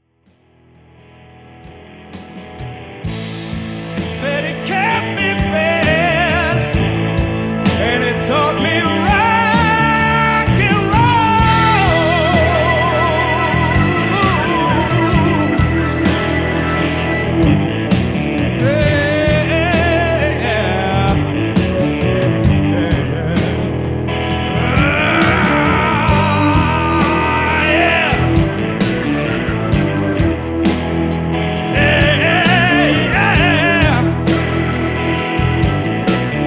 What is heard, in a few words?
Rock Soul Blues